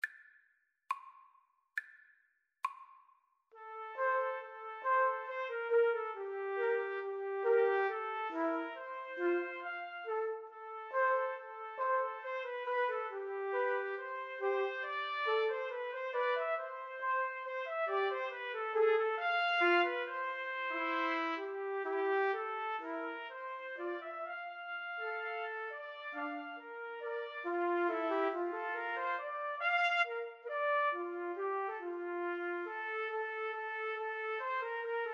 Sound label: 3TPT, Trumpet Trio